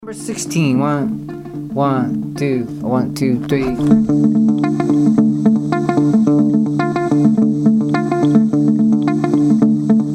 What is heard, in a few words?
mozambique patterns